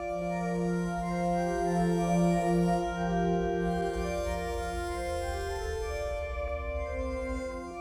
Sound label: Kelele, Kelele Drones; World Percussion